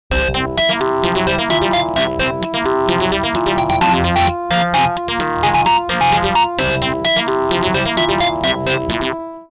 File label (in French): Sonnerie; Bouygues Telecom